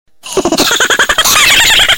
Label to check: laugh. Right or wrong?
Right